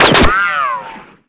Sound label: Boss Intro SFX Poster Flash